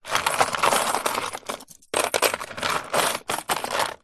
money coins in car centre console compartment movement handling rummage; foley; zapsplat